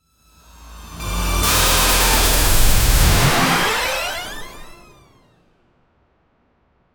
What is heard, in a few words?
fast swoosh with glitch; digital-effects